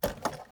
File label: Car Door Open; Fairlight Sound Lib